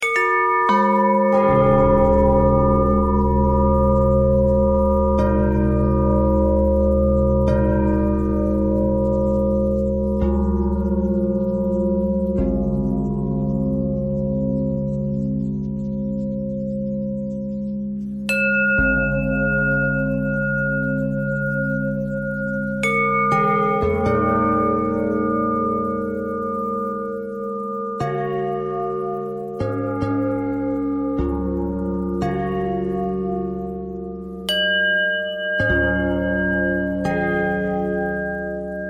bell bell thread pentatonic, bells

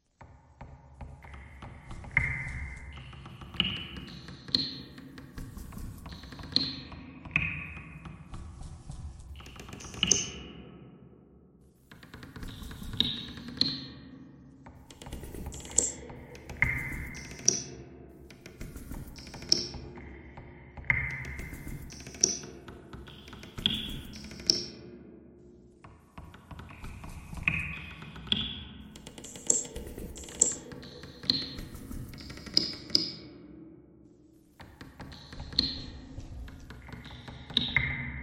bagpipe thread noise; reverb

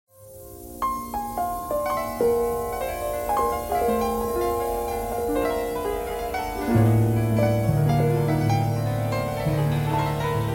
piano noise

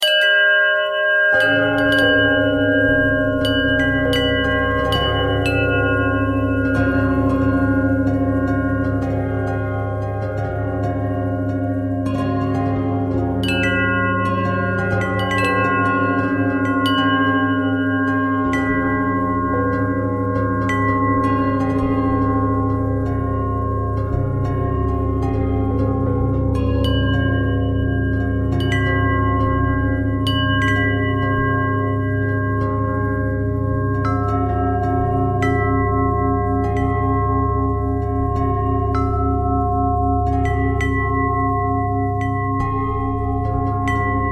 bell bell thread simple, bells

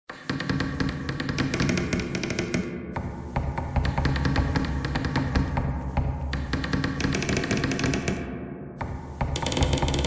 bagpipe thread noise; reverb

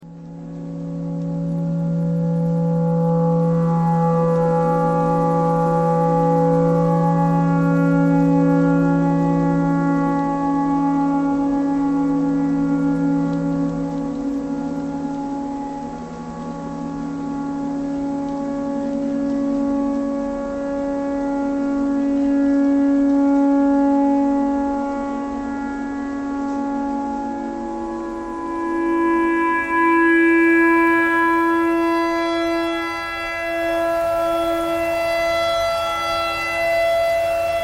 tornado siren clarinet thread noise

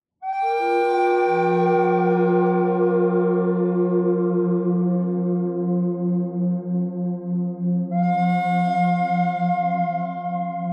bird thread noise; noreverb

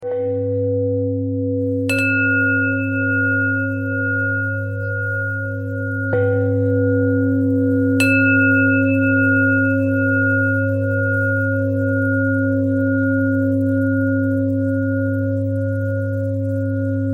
bell thread bend thread; bells

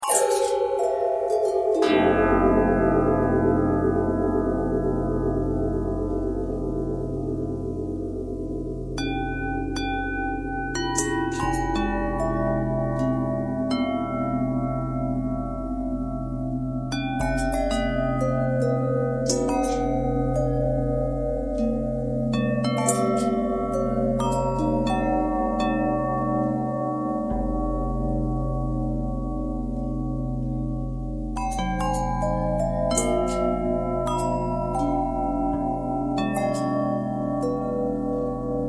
bell long bell twist, bells

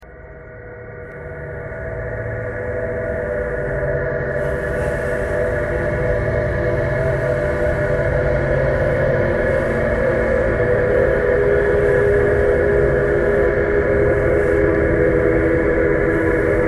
traffic core birds thread buzz

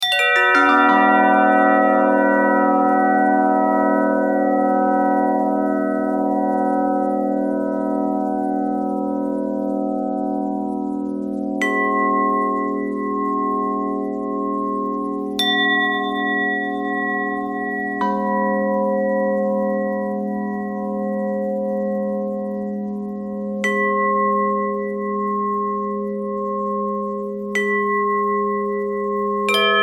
bell thread noise

